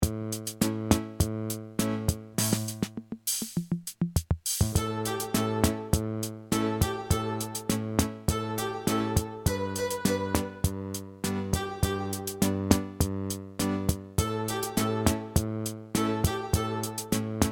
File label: Casio